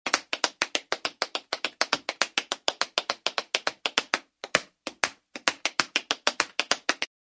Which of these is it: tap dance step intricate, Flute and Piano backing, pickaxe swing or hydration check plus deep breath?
tap dance step intricate